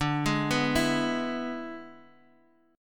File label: E 7th D, guitar